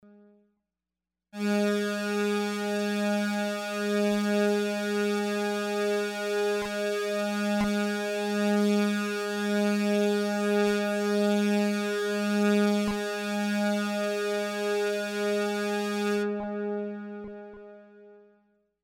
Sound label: OBXa Blurps; oberheim